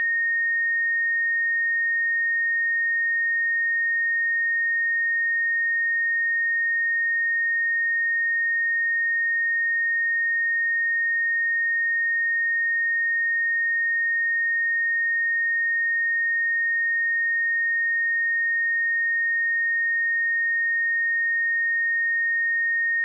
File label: 1850 hz 100 mV; 2 min